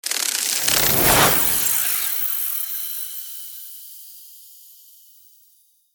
Fairy wings fluttering

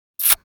Young girl lip smack

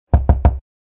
Knocking a door hard

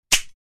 Mouse trap snap

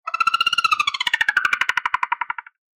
Dolphin chirps and clicks; Fish and Aquatic

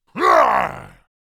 Orc creature fighting vocalization

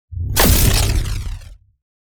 Powerful energy blast shockwave

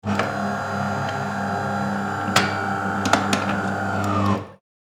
Printer starting up whirring noise; scanner and printer

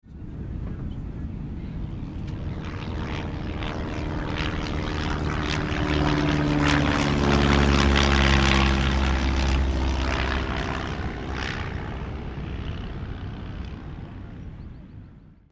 Airport of Johannesburg light aircraft pass by

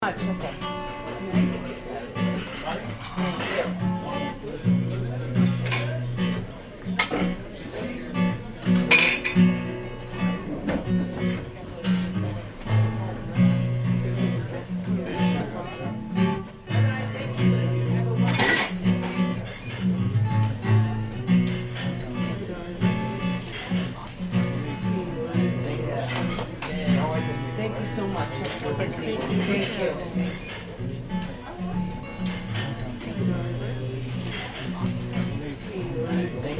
Pub ambience medium mixed crowd chatter

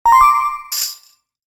Power up retro achievement mobile game; level up

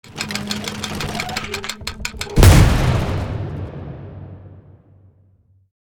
Pirate ship cannon crank and fire

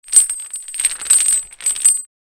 Metallic chains rattle and movements

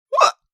Adult man hiccup single

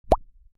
Text pop up

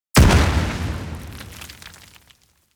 Grenade blast with flying debris; explosion

